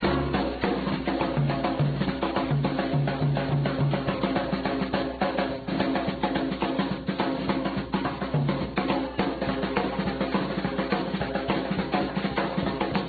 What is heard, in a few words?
blues